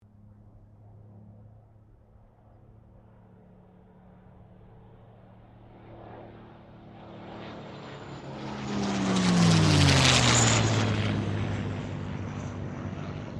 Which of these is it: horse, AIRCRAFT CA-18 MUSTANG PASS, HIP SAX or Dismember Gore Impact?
AIRCRAFT CA-18 MUSTANG PASS